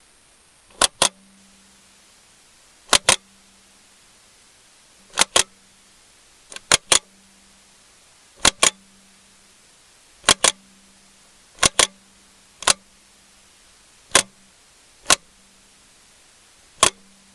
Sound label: camera shutter mechanism; mono; 192khz; sonniss gdc